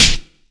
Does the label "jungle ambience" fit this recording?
No